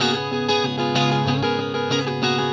Acoustic Guitar; Guitars

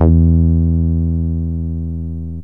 flat bass, yamaha rm1x synth

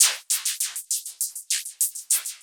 Electronic Percussion

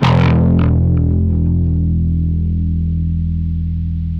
Bass; pitchshifter PSI

